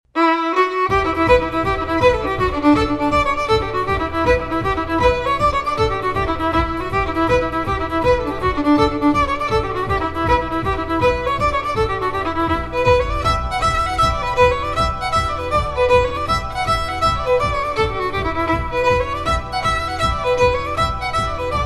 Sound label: Swallowtail Jig - Irish Fiddle Tune